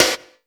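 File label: snare drum, Drum & Bass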